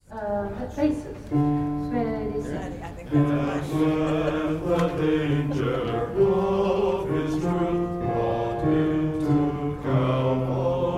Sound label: bass